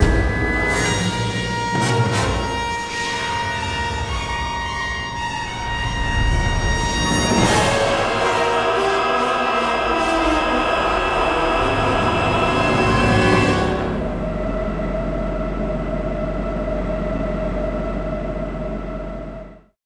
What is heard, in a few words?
music flourish